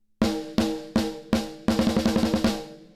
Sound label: Snappy Snare; Xtressor